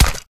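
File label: impact bullet generic headshot human; Titanfall 2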